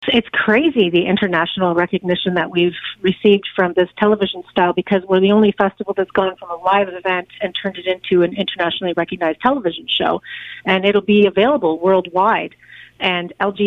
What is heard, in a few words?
south coast jazz